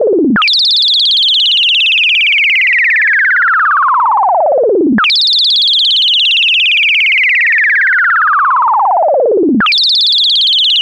electric birds

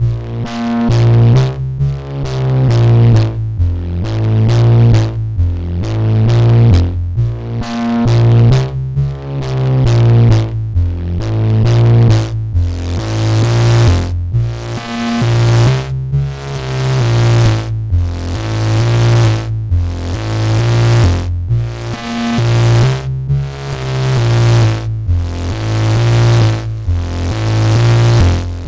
Random Loop test plasma drive

